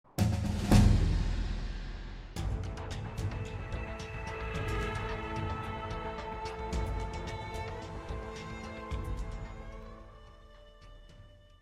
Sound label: level up music with drums; fallout new vegas